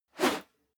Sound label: whoosh whistle; swooshes-swishes